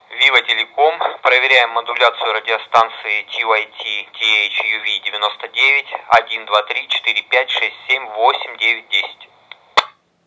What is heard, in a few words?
th uv99 tx analog